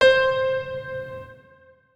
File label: notes, keyboard piano; Hideout Furniture